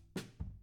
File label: Room A